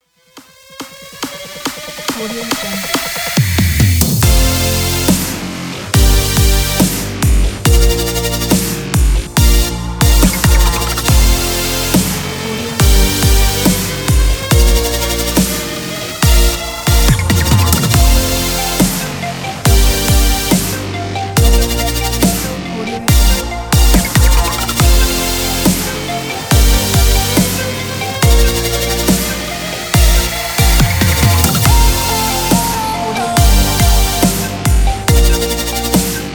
promotion; yunikstudio

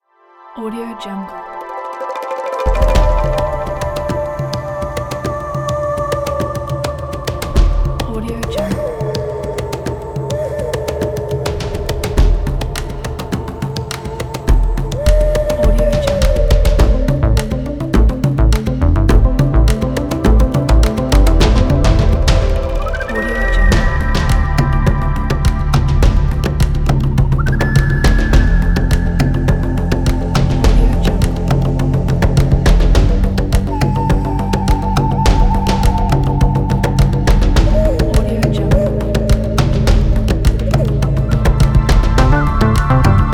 tribal amazonia forest; imusi

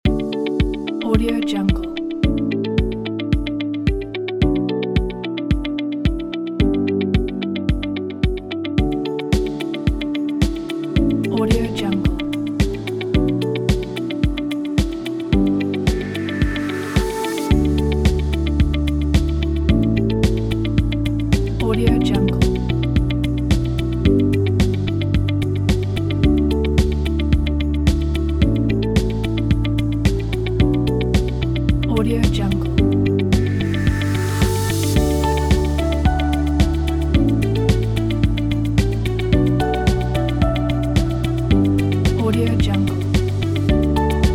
uplifting upbeat corporate motivational

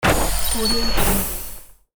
space ship door

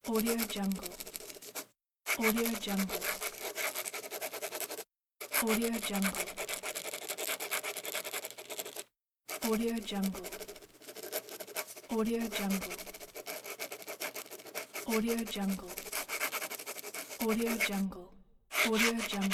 pen; fx library

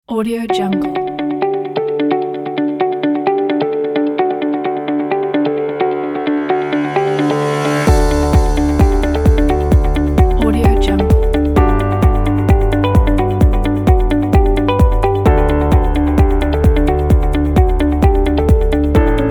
corporate motivational

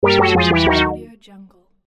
failed

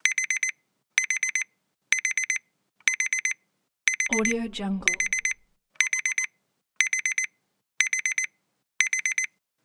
alarm clock

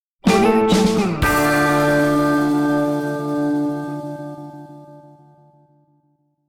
wild west logo

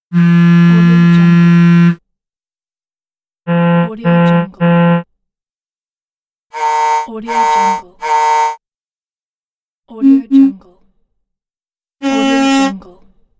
phone vibrations

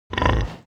animal pig grunt close; smartsoundfx

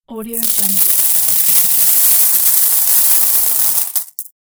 coins falling

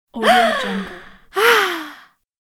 female fastinated breath

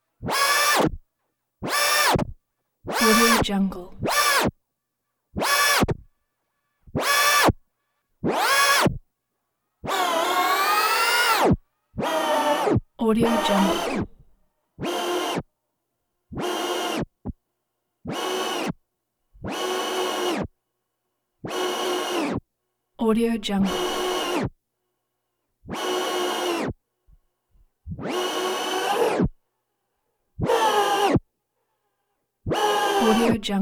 servo short bursts whir various speeds

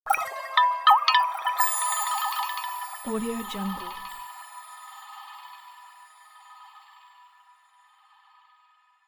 zen sound art

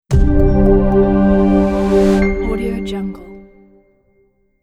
short modern logo

